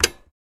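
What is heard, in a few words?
Sony Camera 5850 Pause, buttons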